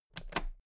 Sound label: toolbox latches open regular double